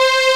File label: Rumbling; Lufia 2 - Rise of the Sinistrals